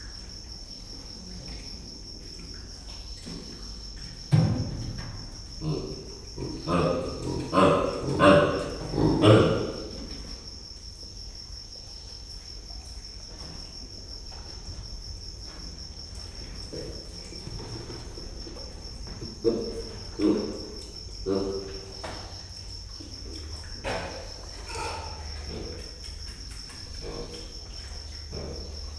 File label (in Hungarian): közelebbről, gallérospávián; gyori zoo 2014